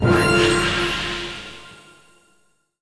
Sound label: Level Up; portal quest android